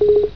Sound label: popup; Menu Popup; windows events